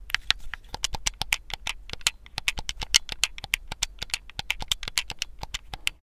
Gamepad pressing buttons multi tapping

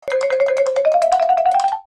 Cartoon running movement funny

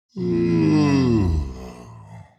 Demon king lord yawn

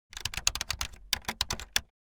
Bluetooth keyboard typing fast

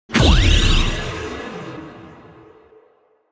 Ray blast

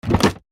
Puzzle game tile swap; video game

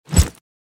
Grenade throw launch whoosh; Military Equipment

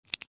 Metal flashlight button press to switch on or off

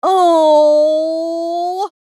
Sad female reaction

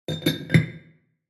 Stacking glassware; kitchen

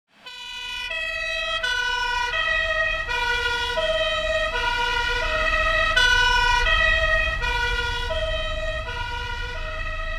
Fire truck passing by with siren

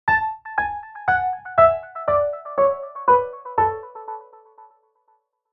Synth and piano A major descending scale

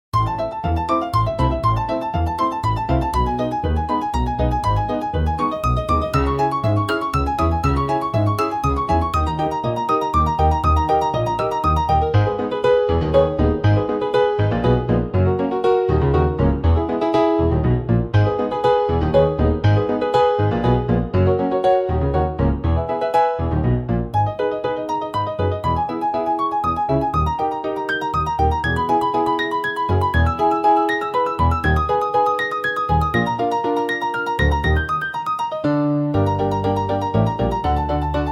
Orchestral funny moment; prelude to a minor inconvenience; meme

